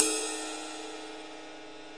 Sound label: Ride Cymbal; Digital Sound Factory Planet Earth